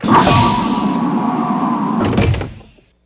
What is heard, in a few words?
shuttle door close; trek